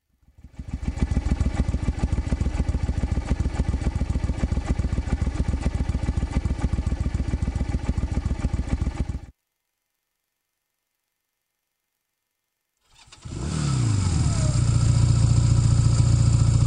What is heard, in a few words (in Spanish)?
Motos